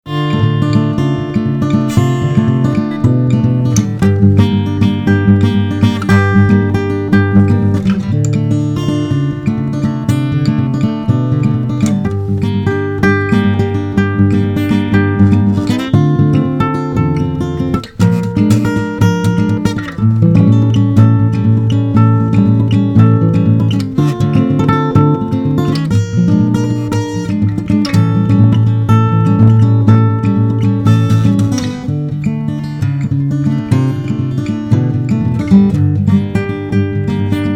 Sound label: Fingerpicking